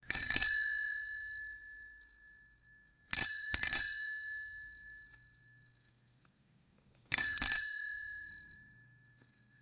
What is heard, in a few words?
light bicycle bell ring s